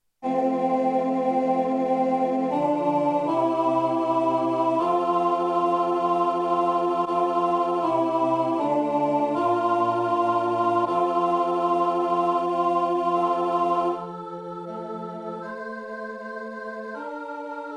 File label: Alto, rehearsal